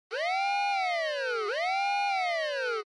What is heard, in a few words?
Police Car Siren Fake Fast